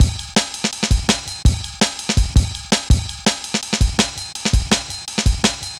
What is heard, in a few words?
Jazz Ride, Drum Loops, 165.5; Zero-G Jungle Warfare 1, 2 & 3; Jazz Ride B; 90 s Sample CDs